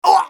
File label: scav wound; eft